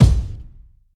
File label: loud kick drum single hit b key